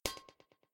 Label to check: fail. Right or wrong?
Right